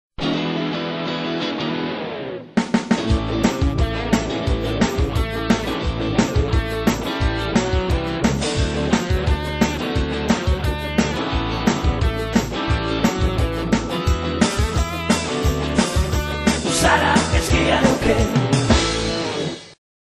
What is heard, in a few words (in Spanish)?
jingle; guia bilingue neuquen